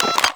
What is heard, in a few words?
Pager Close; Warframe; Arthur